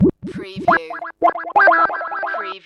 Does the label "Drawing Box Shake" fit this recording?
No